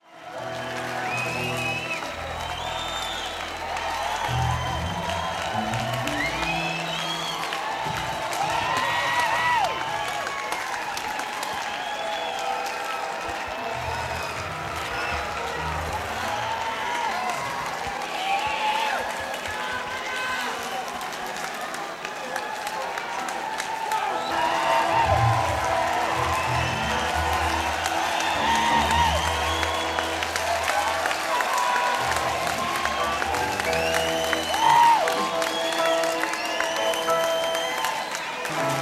Crowd Tuning

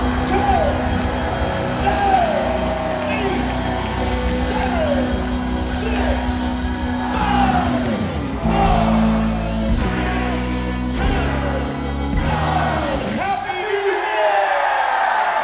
new years eve countdown

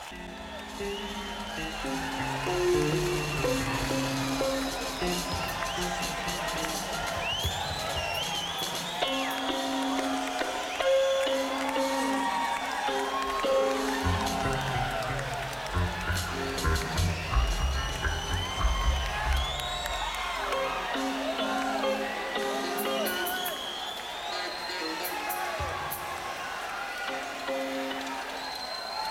Crowd Tuning

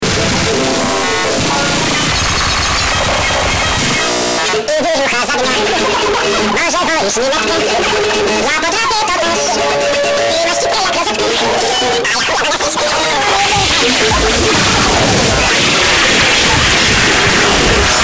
noize

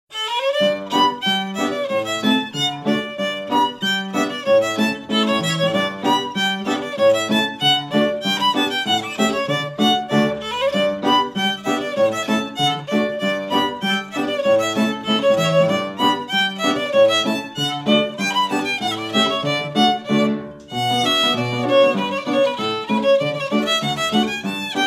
Staffordshire Hornpipe Duet

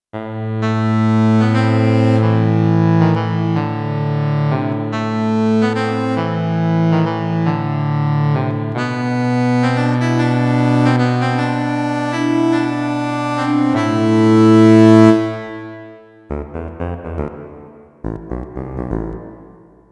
Sax; Kawai K1; Presets Bank 2 card A; SafeSax

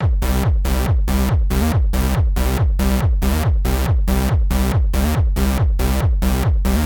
Beat Bass, Techno Bass Loop

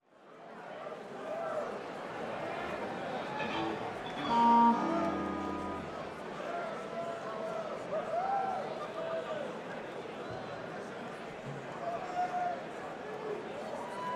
Tuning; neumann U89i

